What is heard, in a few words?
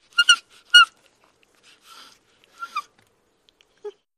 Chimpanzee Whines